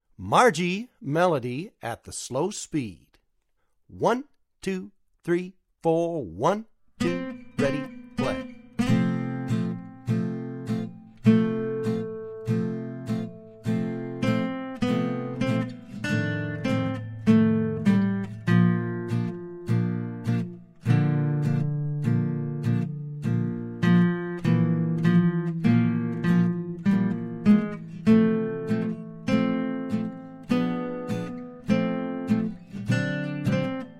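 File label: guitar melody slow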